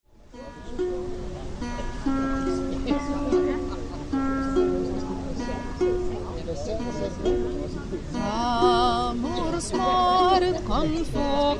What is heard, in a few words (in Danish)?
vikingemusik og sang; vikingemarked